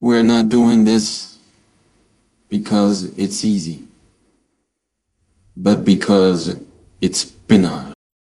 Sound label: jingle